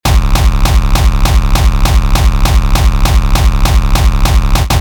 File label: Awake Tritone; kickoorator